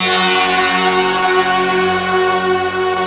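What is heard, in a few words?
F#3, rough stick; Best Service; Advanced Media Trax Modern Composer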